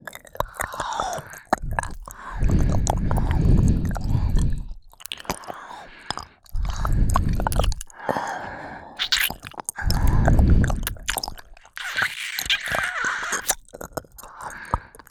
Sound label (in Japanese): 二人で耳舐め; 二人に犯され